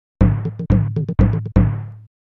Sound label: Game Start; Super Mario Yoshi's Island